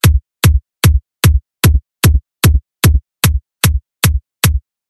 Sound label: Kick